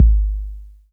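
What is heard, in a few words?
bass drum 808 Tape Decay D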